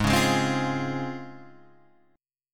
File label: Db Major 7th Ab